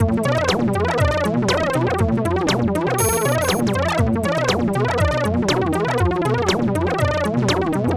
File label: Electro; Pit and Temple B